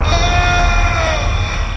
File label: in hell; Various Strange Sounds and Effects